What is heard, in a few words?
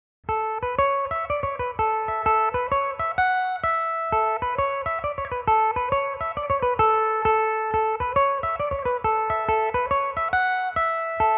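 A major, irish mandolin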